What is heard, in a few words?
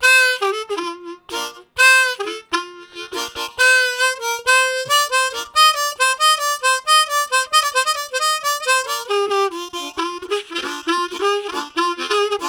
Harmonica, Riffs; Zero G - Harmonica